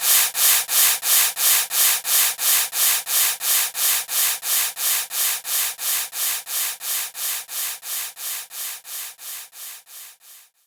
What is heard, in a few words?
Brush Swirl